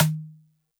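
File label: Xylo Snare DX100 D sharp 1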